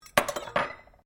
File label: cutlery general place bench, Household cutlery; Kitchen